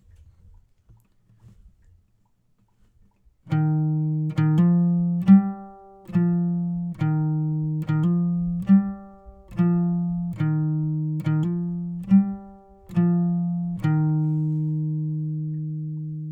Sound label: gtr outro